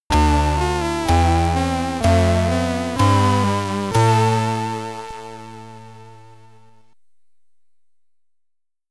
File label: Game Over (SID Stereo), Commodore 64